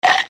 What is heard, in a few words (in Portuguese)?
efeito mordida